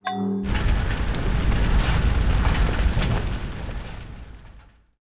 Coal Plant; Buildings; Civ4 beyond sword

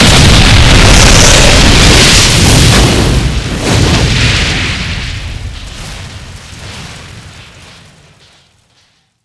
explosion tanker truck flame short; Explosions

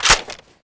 skorpion reload clip in, foley

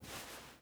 ui pickup cloth; Rust

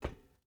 footstep boots metal walk; Rust

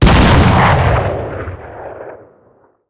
cannon 37mm Sh37 shot

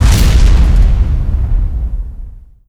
airport elevator exp boom; Call of Duty Modern Warfare

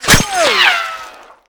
ricochet; Escape from Tarkov

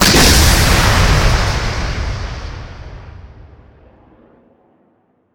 Missile Shoot; Weapons; DCS

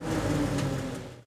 engines; World of Tanks

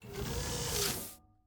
ship engines; World Of Warships

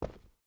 footstep rubber boots cloth run; Rust

